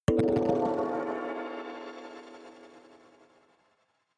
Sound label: notification kalimba magical body hit